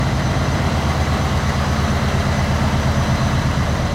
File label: Sonic Ferry Engine Loop